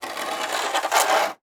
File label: Weather Snow Shovel Drag Light Snow On Cement; AdobeCC